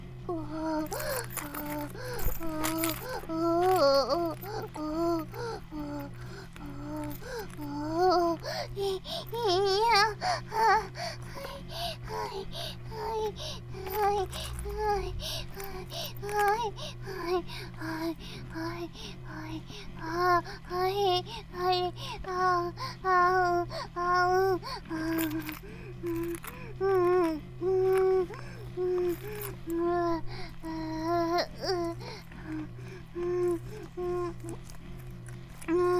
バイブ放置; イクたびにわけて

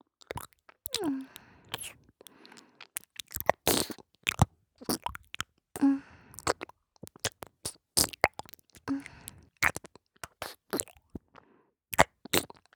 ちゅぷっちゅぷっ, ASMR

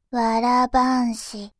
わら半紙, ASMR, バイノーラル右

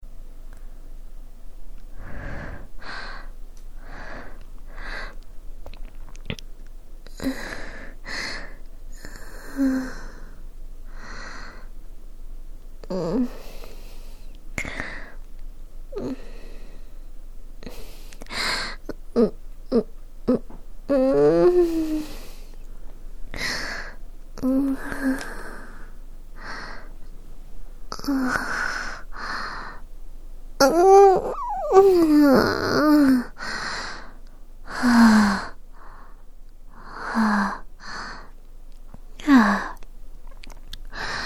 桜喘ぎ声 セリフなし

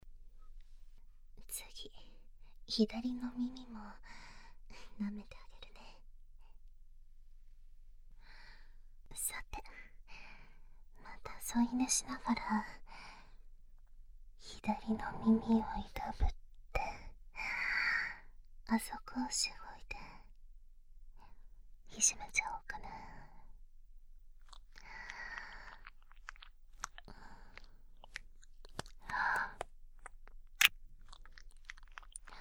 吐息たっぷりの耳舐め 手コキ 左耳

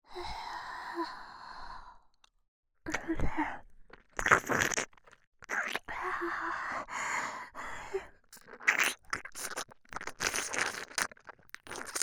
耳舐め リピートボイス 左耳; 【NTR妻水瀬苺】

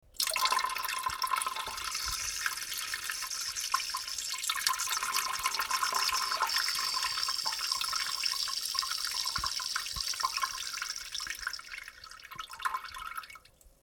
ばちゃばちゃしょしょしょおしっこ

